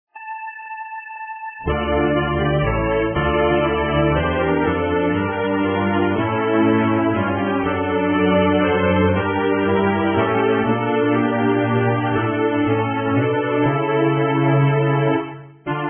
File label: Sonata karaoke; Corelli; Sonata VIII